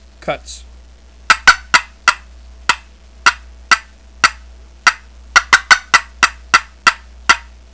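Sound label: grand cutts, calls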